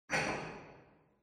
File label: warehouse brick drop; ambient, rocks